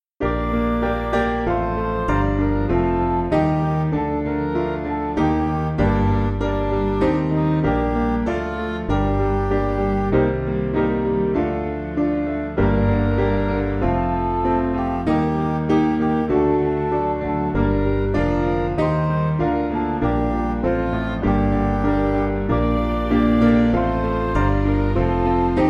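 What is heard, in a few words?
Music Backing Files for Churches; What Is This Crying; St Marks B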